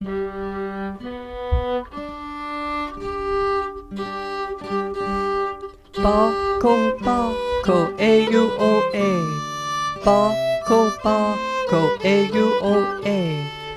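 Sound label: Soprano Words; Bacco Bacco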